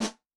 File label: Dance P, Yamaha DTXpress-II snare